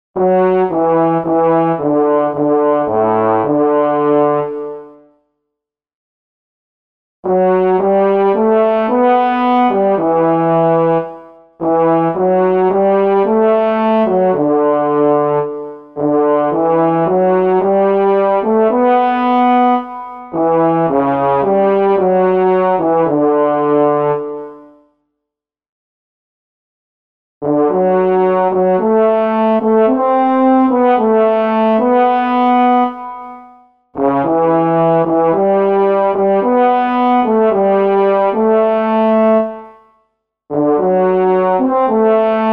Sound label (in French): petit duo pour trombone timbales